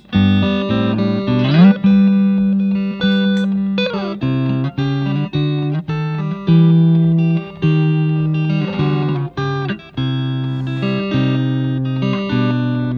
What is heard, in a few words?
telecaster, fender guitars